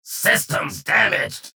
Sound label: pain ability damage; strogg; low health; strogginfiltrator